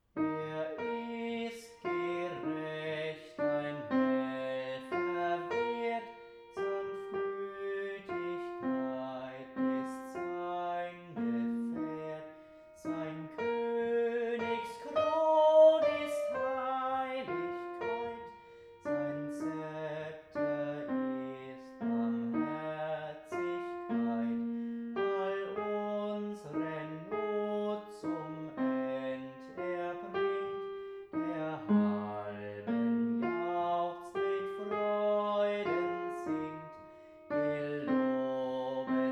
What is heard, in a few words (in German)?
Macht hoch die Tuer - Bass